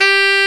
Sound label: SAX TENOR F, SAX Tenor ff